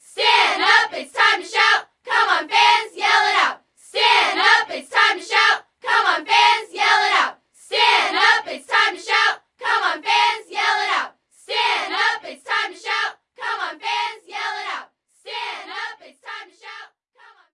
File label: Basketball; Sfx Basketball